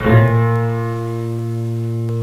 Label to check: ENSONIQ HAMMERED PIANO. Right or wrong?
Right